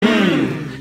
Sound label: hhhmmmmmm